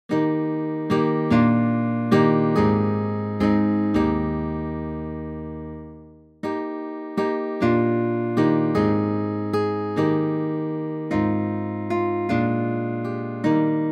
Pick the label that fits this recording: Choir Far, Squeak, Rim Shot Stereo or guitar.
guitar